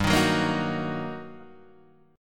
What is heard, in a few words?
G Minor Major 7th Sharp 5th, down, Guitar, Open D